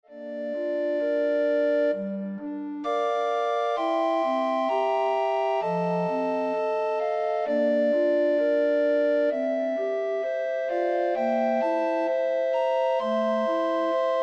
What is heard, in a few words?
Recorder Ensemble